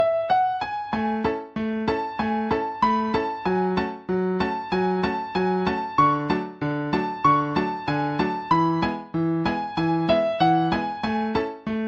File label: cute piano ringtone